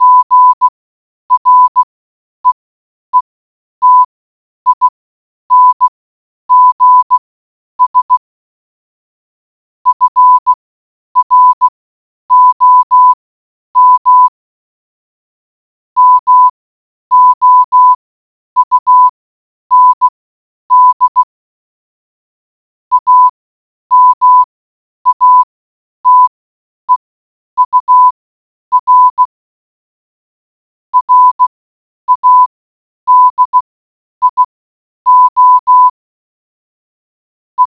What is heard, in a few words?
morse code